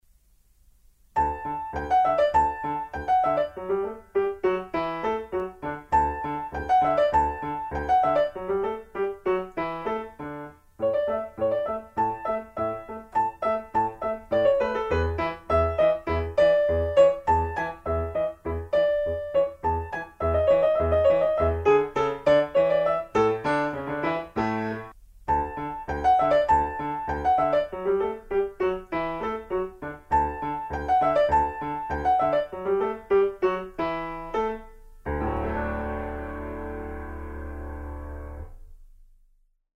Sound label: Bach BWV 126 Musette in D